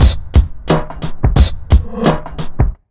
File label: 88 BEAT, Underground Hip Hop; USB Soundscan vol.02; AKAI